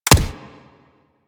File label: Weapon Punch Rifle